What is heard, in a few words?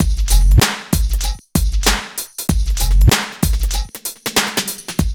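DRUM loop, right; East West - Phat & Phunky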